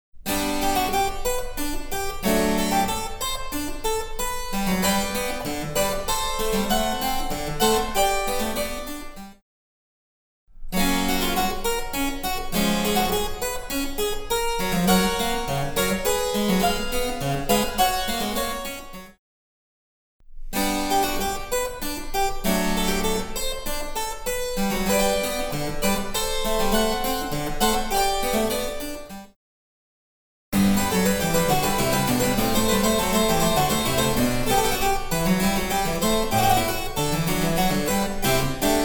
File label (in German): Cembalo; Mark Lindley WTC 1